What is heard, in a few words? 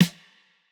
snare; Reference Beat BPM120; j city pop 1980